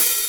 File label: HH, Dance Mega Drums; Best Service; HH HIP